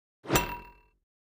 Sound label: Pop Up Whip HF Tap